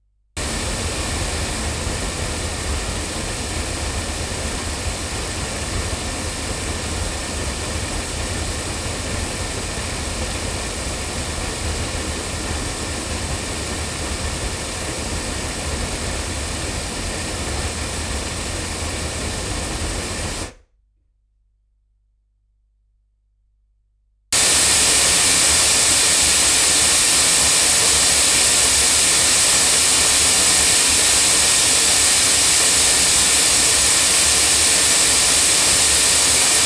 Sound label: cardioid loudspeakers; microphones; studio projects; SP LSD2